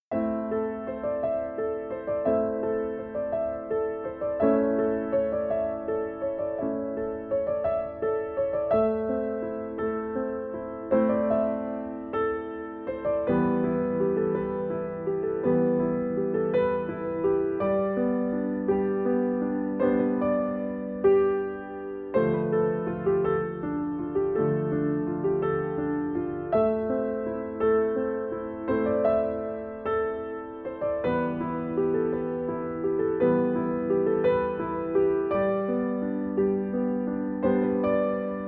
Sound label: solo piano